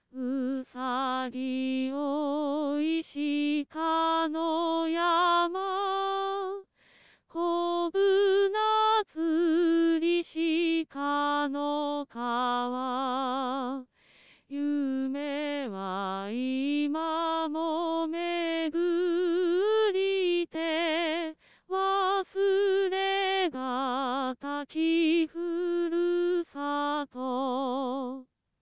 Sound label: song